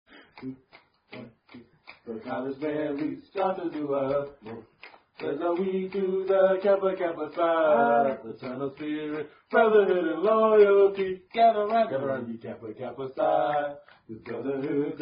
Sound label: Fraternity Song, Unknown Fraternity Drinking Song